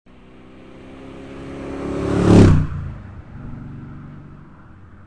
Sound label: driveby